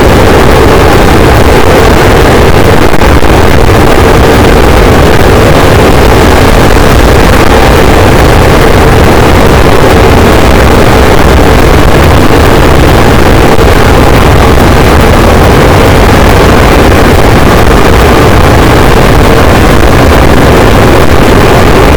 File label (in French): cachalot negatif, boat, Physeter Macrocephalus